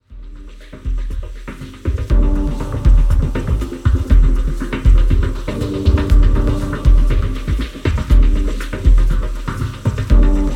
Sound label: dub